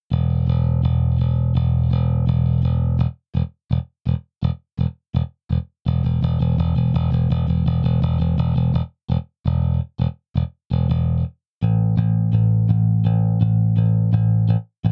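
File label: 70 J Bass Default setting Pick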